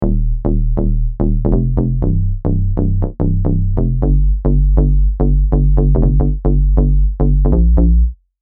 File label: Bass, Deep House; Unison Deep House Collection for Serum